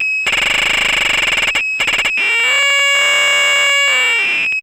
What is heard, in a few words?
synth FX; AMG - Vince Clarke Lucky Bastard